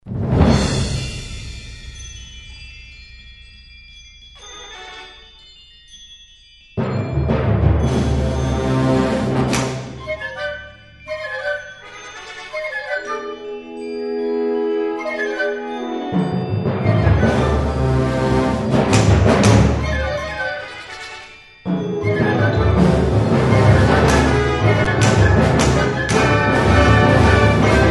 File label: Fanfare